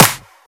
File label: clap single shot D key; single hits one shots